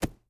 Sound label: arrow dirt, attack impact